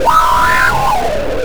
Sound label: DISTO FX; Big Beat Samples & More; AKAI; DISTOFX KIT